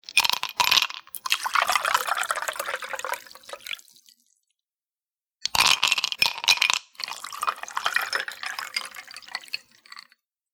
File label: Ice Drink, Pouring a drink with ice.; Drink Mixing